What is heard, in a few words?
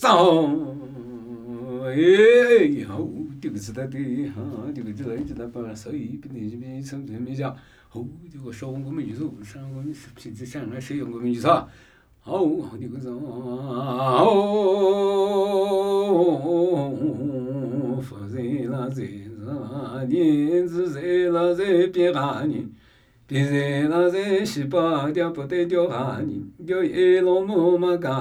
Namuyi Tibetan Ritual Chanting